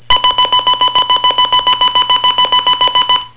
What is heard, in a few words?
ALARM